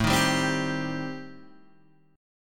A-Minor 7th Flat 5th, Guitar Open G, chord

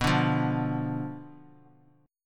B Diminished B down Bass Standard; Bdim strummed

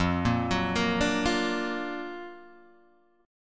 F sharp Augmented Major 7th F

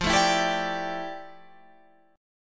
F Major 13th Guitar Standard; strummed; chord; FM13; 13,12,14,12,15,15; JGuitar

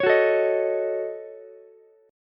D sharp Minor 7th Flat 5th D sharp, down, Ukulele; D#m7b5 strummed

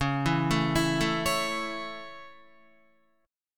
C sharp Minor Sharp 5th down Guitar; C#m#5; arpeggiated; JGuitar

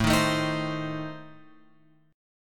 A Diminished A, chord shape; Adim Chord {5 6 7 8 X 8} strummed

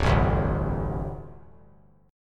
D 7th Suspended 4th, down; strummed; JGuitar

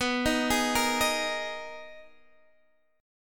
Eb Suspended 4th B; Ebsus4/B arpeggiated; JGuitar

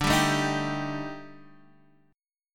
Eb Major 7th Flat 5th D, guitar

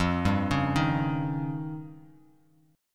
F 7th Suspended 2nd Sharp 5th F Bass Standard; F7sus2#5 arpeggiated

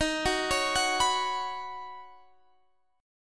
B Suspended 2nd, Eb, chord; B2/Eb; arpeggiated

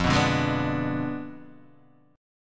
F Diminished 7th, Guitar, DADGAD; 3,2,0,1,2,0

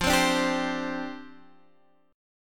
G Suspended 4th F; Gsus4/F strummed

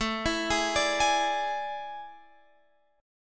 A Major 7th Suspended 4th, Guitar, Open F; AM7sus4; arpeggiated

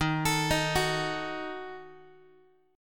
Eb Diminished, Guitar; Ebdim arpeggiated; x,11,12,13,10,x; JGuitar

